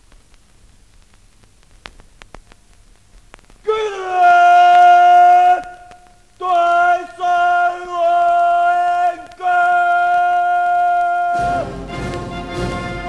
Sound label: cheering song